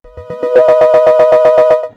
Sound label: Strange Sound; Sonic Foundry (Sony Creative Software) - Stylus Pressure Urban Grooves on Digital Wax; Chase Scene